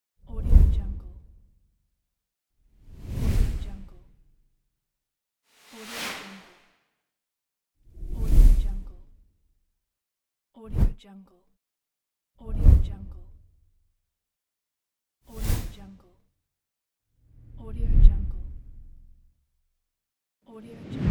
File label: woosh; dauzkobza